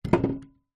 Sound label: food apple down in empty china fruit bowl